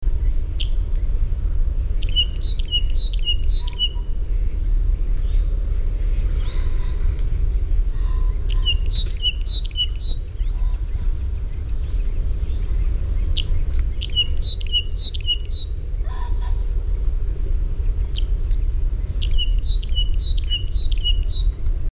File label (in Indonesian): suara kicuit hutan